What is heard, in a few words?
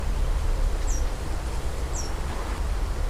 Blue tufted starthroat; پرنده اخترگلوی جقهآبی